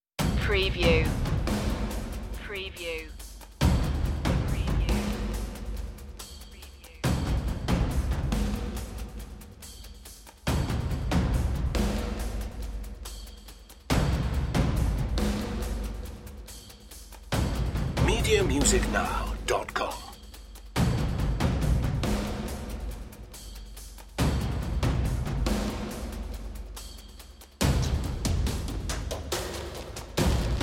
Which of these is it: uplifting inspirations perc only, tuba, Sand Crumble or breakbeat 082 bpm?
uplifting inspirations perc only